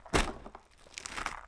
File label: Rocky Step, outdoor, Footsteps